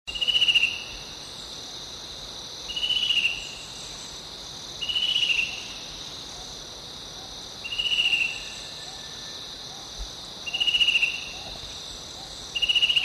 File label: chestnut breasted cuckoo